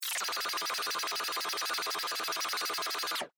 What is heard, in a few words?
Laser Beam Ray Gun, Stun; Science, Fiction; Lasers